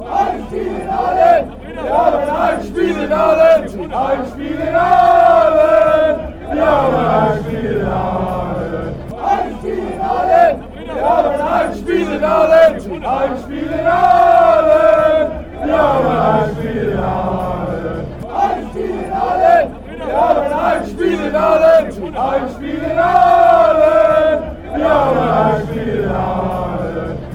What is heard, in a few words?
home game, fanchants; aalen